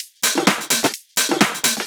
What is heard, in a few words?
Houseloops 128BPM; Vengeance Samples